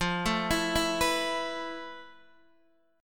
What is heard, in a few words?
F Major Sharp 11th, Guitar, Open D; x,8,7,10,7,9; down; chord shape